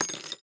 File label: inventory loot simple find coins; war ui; esmteam